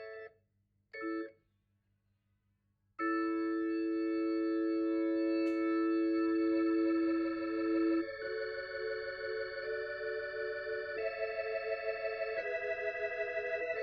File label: Hammond Leslie